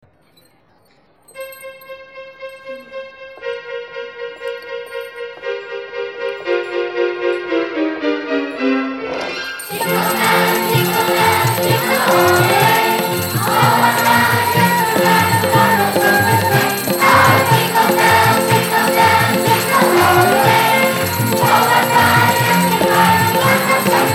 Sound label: childrens choir; 10 Jingle bells; christmas 2007